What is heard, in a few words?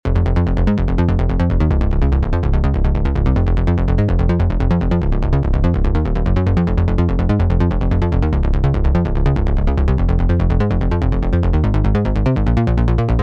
Bass Midi Phrygian F, goa trance